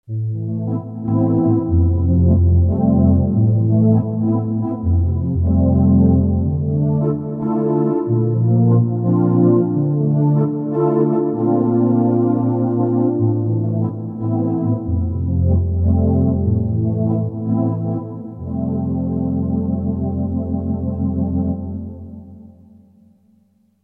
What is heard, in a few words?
Pad Warmth; xp-80